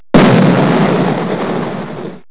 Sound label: explode